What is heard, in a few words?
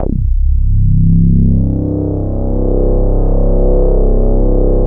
DIVE, ARP 2600; Big Fish Audio; First Call Vintage Keyboards